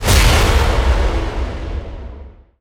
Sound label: big Win Text Change